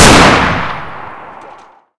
fn fal

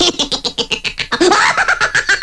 hehe, irish pub

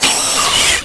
ant die

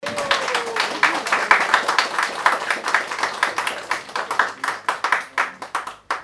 clap

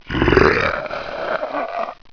zombie die

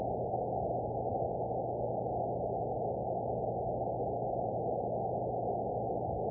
air seeping inside

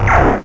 fusion firing major, weapons

